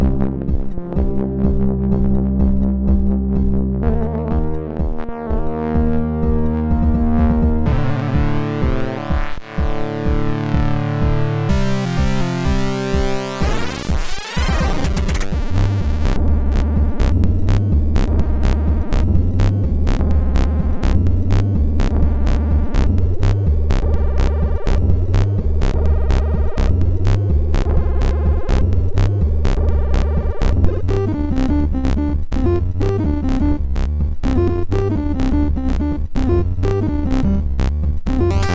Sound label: Jazzy Ocean, MOS6581R2